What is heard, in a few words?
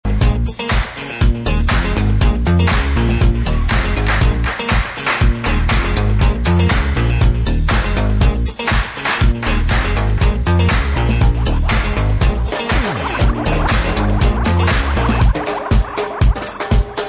agogo